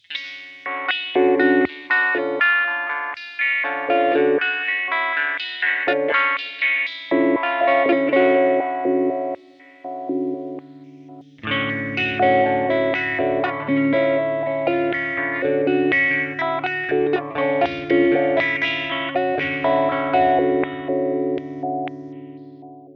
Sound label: filter SH; molecular patches